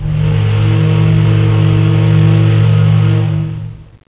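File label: steamship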